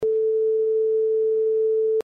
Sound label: sine wave 440 hz